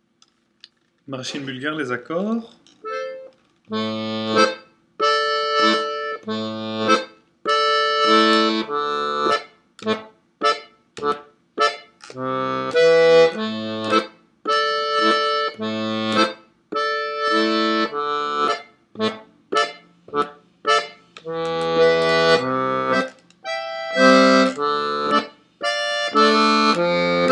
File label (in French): Maraîchine bulgare accords, accordeon